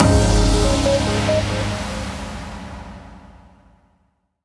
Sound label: JGL BATTLE BAD FINISH; Girls RPG Cinderellife; 3DS